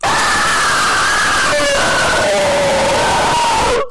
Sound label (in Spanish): super grito